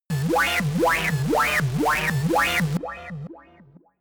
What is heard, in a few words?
shot futuristic wobble ringing low, digital; soundpool